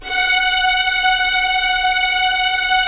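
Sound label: Strings Violins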